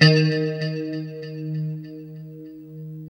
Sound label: LO-E D#3, Guitars; Spectrasonics; Hans Zimmer Guitars VOL-1; JC-SHEEN